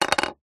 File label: plastic object hollow small down on surface; foley